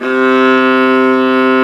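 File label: C2, strings; AKAI S-Series CD-ROM Sound Library VOL-8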